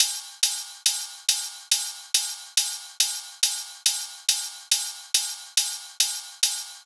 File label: drum Loop 140BPM Ride